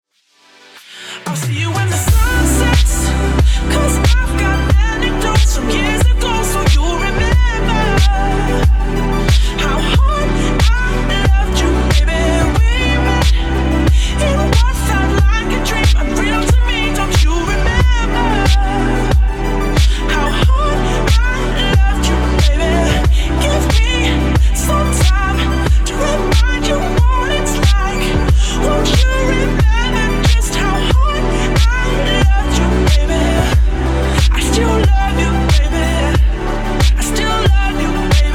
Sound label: slow grooves